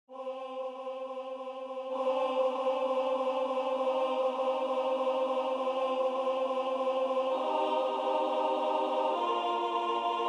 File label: Alleluia A; alt